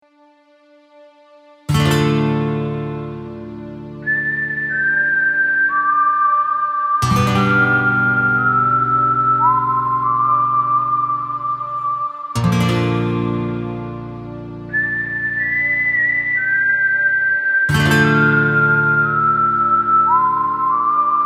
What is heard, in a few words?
western